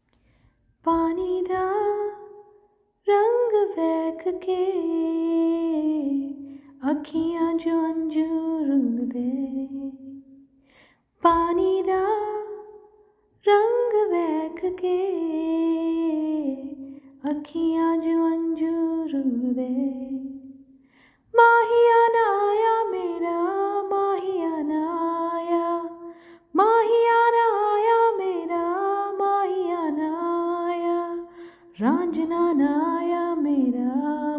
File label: Vocals